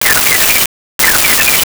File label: Sci Fi Alarm Loop